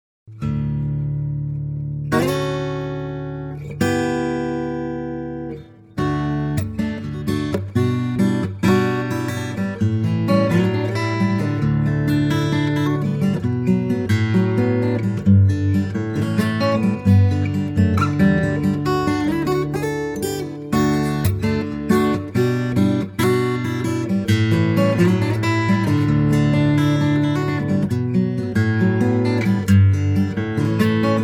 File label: Guitar